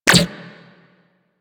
Shield Impact Default; Warframe; Volt

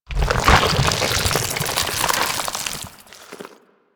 Infested Bone Blade Movement Foley; Warframe

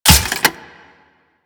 Crutch Step Dirt; Warframe; Grineer Ghouls; Ghoul Drill Sergeant; Foley; Footsteps

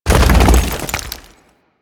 Earth Crack; Warframe; Warframes; Priest

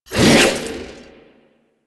Whip Whoosh; Warframe; Khora

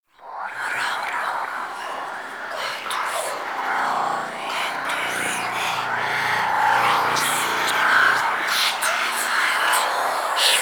Whispers High Pitch; Warframe; Ambience; Entrati

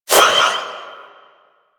Army Replicant Proj Throw; Warframe; Warframes; Hoplite

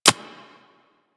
Bullet Impact Generic; Surface

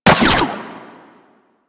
Fire Mech; Weapons; Seer; Warframe

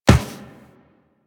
Walk Metal Perforated, Footsteps

